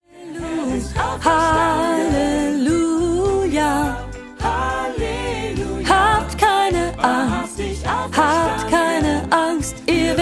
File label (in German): Lern-Sopran2